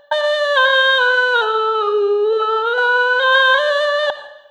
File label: soprano